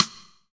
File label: Big Band; Roland L-CDC-01